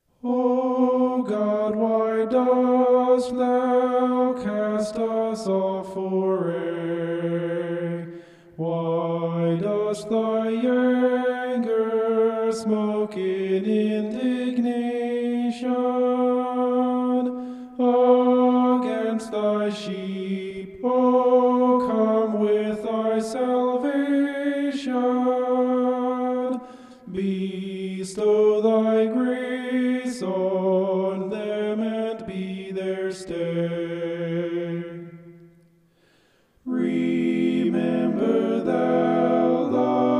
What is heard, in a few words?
vocal homoph; psalm 74